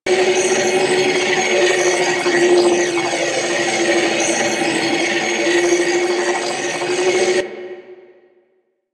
Bounce Trap Loop; Warframe; Trapper

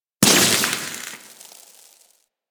Spark Shower; ambience; props; one offs; Fortuna; Warframe; Venus Open World; Venus Solaris United

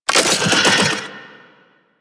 Wall Eye Detatch

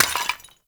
Vase Clay Tiny; Objects; Vases

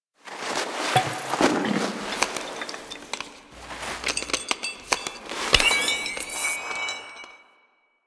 Orb Light Pick Up; Ambience; Warframe; The New War; Quests; Zariman

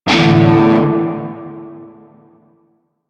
Shawzin Pent Maj Chord C; Music; Warframe; Narmer Shawzin

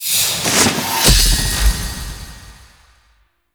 Blessing Screen Open Sound, UI; Warframe; Blessing Device Menu

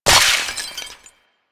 Loot Crate Burst; Ambience; Entrati; Warframe; Infested Eris

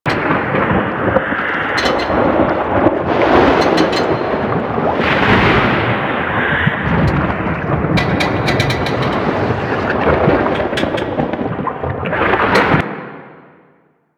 Energy Tube Loop; Props; Ambience

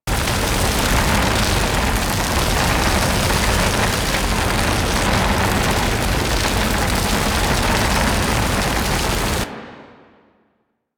Elemental Breath Fire Loop; Warframe; Dragon

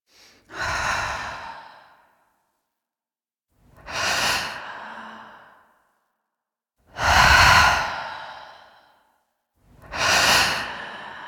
Reveal Breathing; Cinematics; Warframe; The New War; Throne Room

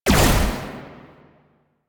Amp Silenced Shot, Weapons; Warframe; Operators; Amplifiers; Drifter

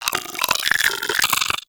Arachnoid Vocal Grapple; Enemies; Vocals; Warframe; Corpus; Arachnoid Hunger

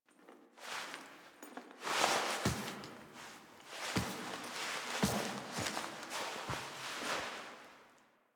Foley; Warframe; Zariman; Epilogue

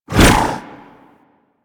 Melee Long Whoosh; Warframe; Weapons

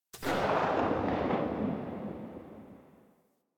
Elite Shotgun Tail; Warframe; Weapons

